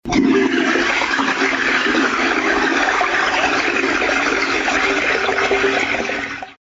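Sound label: toilet; Zvuky do mobilu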